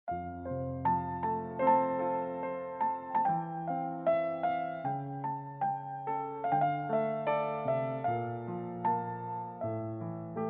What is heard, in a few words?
piano FLUTE